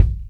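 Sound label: bass drum hit, roots reggae; Sounds Good - Roots Reggae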